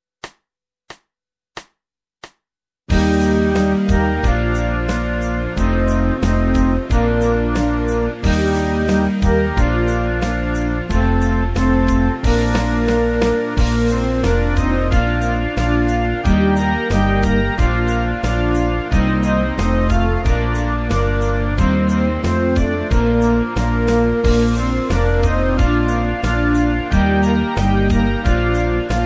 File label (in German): Schulband Spanisches Kinderlied; Musizierklassen; Los pollitos dicen pio pio pio; B Instrumente